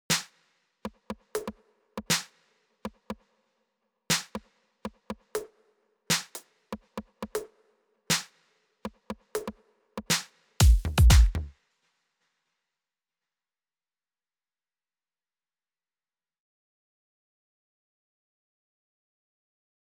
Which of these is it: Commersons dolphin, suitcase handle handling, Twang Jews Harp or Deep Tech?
Deep Tech